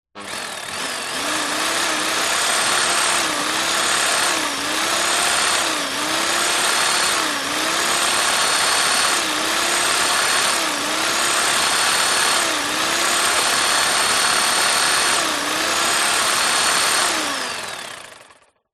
household knife electric on operate off burst dry revs